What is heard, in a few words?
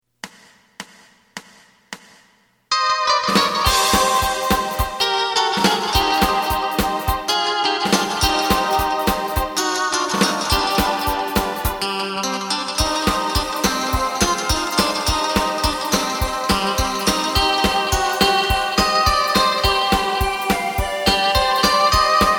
bass